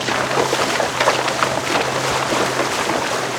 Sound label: bridge water; Fate Undiscovered Realms